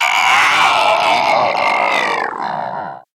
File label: idle, zomb female; nmr